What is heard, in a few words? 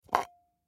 Aerosol Can Pick Up From Counter; Foley